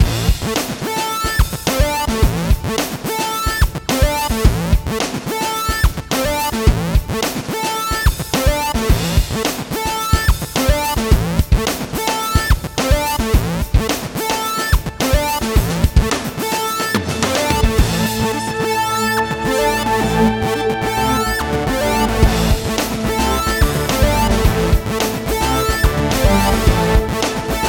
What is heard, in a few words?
BreakBeat